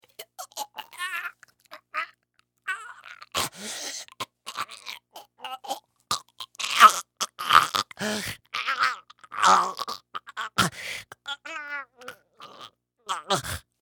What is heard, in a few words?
Human Male Choke Long; Gagging; Voice